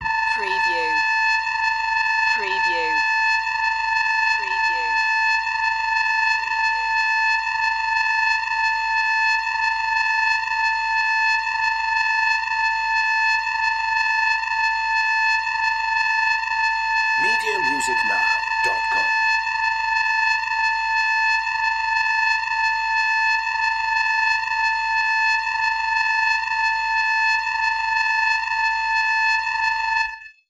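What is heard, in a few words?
Alien Warning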